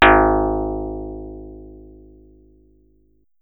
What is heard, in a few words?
Wobble Spring; Feel; NiceVibrations; WobbleDemo